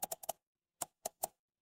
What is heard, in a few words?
office mouse click